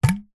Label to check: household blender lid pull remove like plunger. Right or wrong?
Right